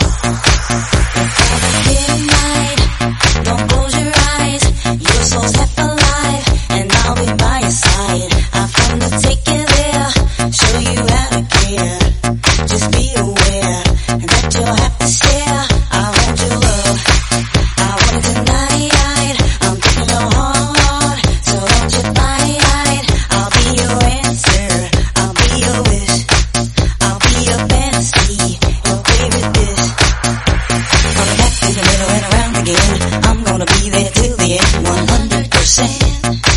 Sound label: 130bpm, Bbm